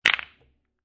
Billiard Pool Snooker Billiard Spread Shot; Sports; Lynne Music

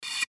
Piccolo Mouthpiece, Piccolo Mouth Piece Blow Airy; Musical Piccolo Blow Air In Mouth Piece; Woodwinds; Blastwave

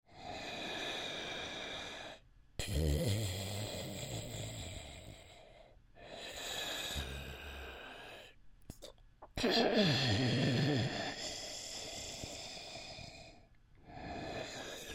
Creature Troll Breathing Calm; Monsters; Horror; BigRoomSound

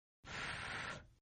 Slurp Female, Drinking; Human

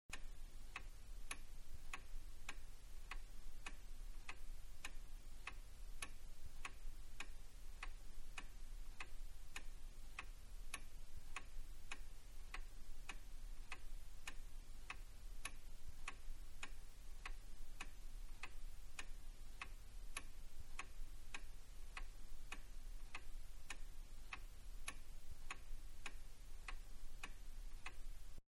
Grandfather Clock Ticking; Machines; Clocks; Manhattan Production Music

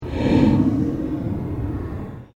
PULSE SHORT SWEEP

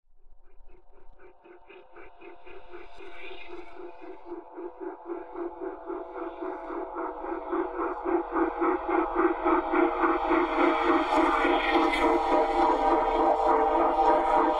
Horror Drone Bed Chopper Flange; Scary Tones; Blastwave

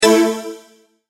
multimedia tone exclamation; McKinney Sound

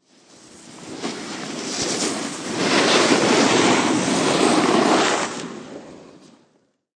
Snowboarder Stops, Snowboarder comes to a stop; Sound Effects:Weather

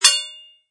Sword Strike, Sword impact; Combat

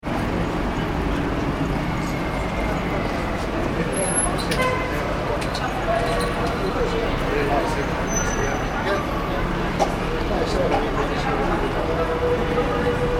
ambience urban city night; City Or Town Street Ambience - People Walking , Talking And Shouting With Bus Idling In Ba; Cities; Backgrounds

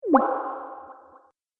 Strange Plop Hit Echoes, Impact Sound Effects; Miscellaneous Impact Sound Effects; Andrew Fleming

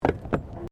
Tram Horse Drawn Step Wooden Bridge

